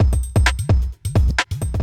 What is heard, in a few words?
electro kit 130